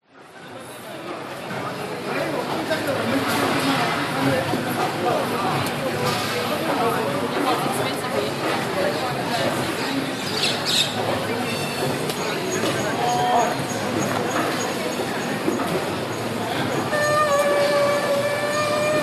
market indoor people food prep chopping birds little india singapore; tekka